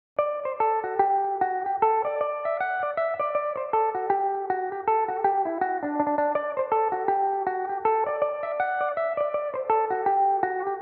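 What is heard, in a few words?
D mixolydian, irish mandolin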